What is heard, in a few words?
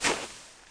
pl snow light, player; cstrike